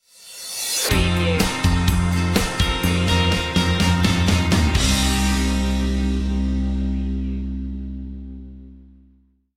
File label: sugar coated stinger